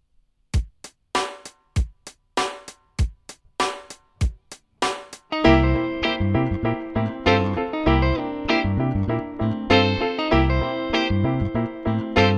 pop soulfeel

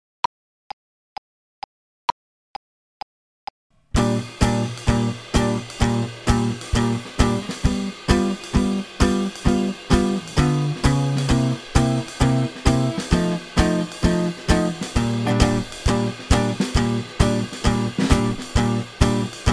swing slow

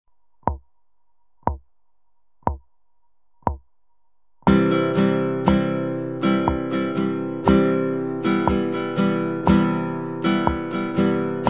strumming

